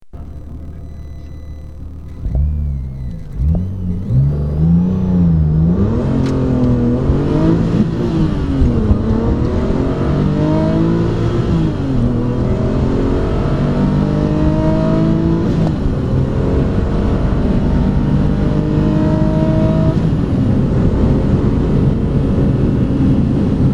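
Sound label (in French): 996 GT2 - a bord, Porsche; le son des moteurs; (2001)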